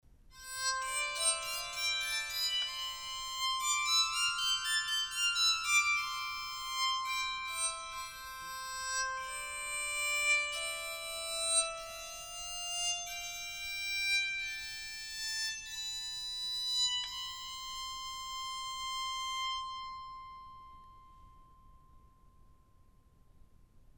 chestnut soprano sound, bowed psaltery

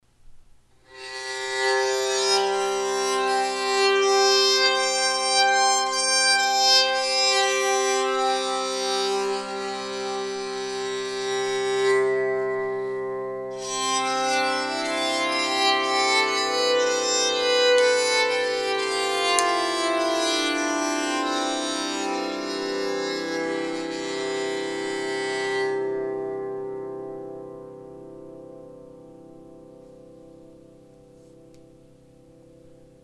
baritone, bowed psaltery; aspen cherry

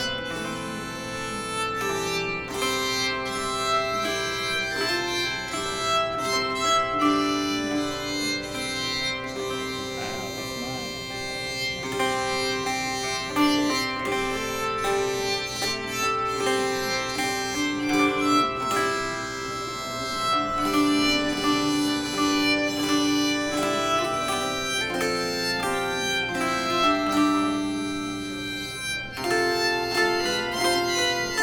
Tenor, bowed psaltery; be thou my vision

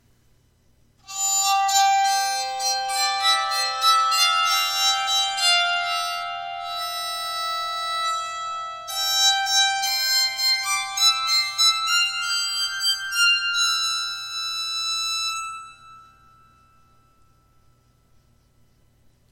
song, bowed psaltery

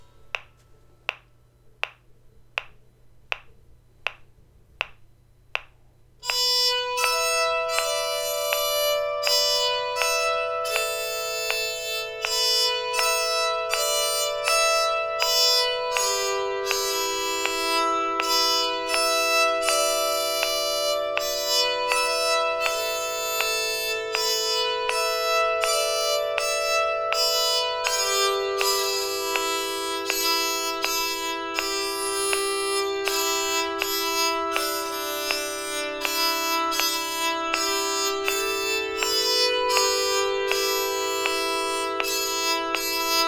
Cluck Old Hen, bowed psaltery

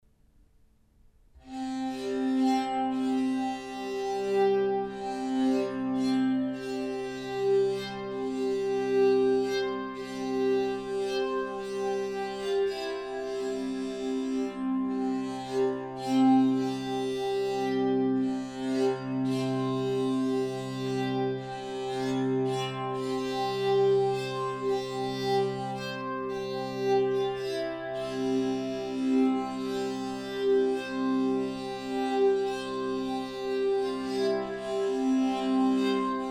chestnut bari sound, bowed psaltery, Baritone

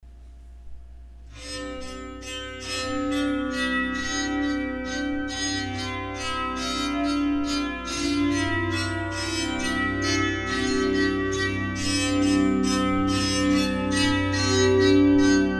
Redwood Bass Baritone, bowed psaltery

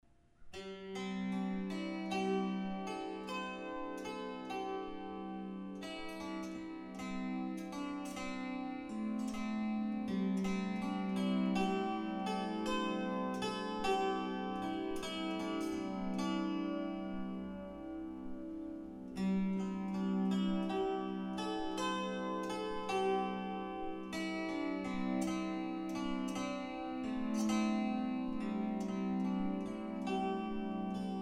Redwood Bass Baritone Plucked, bowed psaltery

